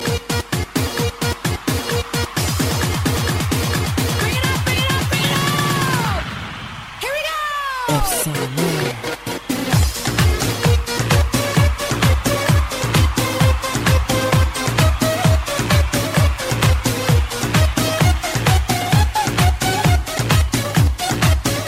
Disco Remix

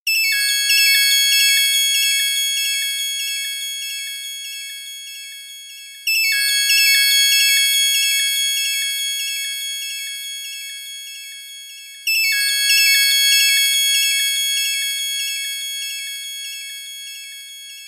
Chime Loop

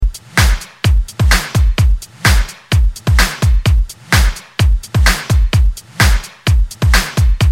Good Beat

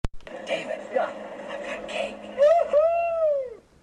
Woohoo

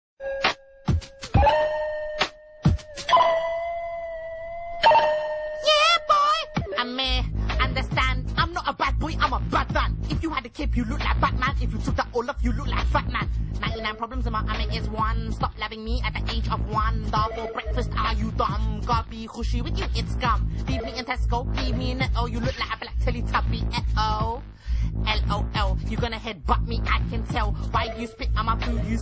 Rap; Badman

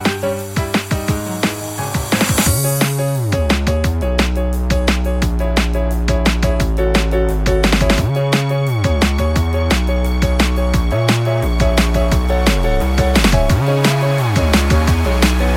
Elevator Music REMIX

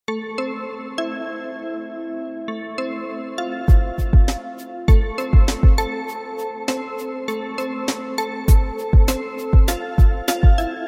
Tic Tac Drum

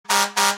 Grover 1510 Air Horn

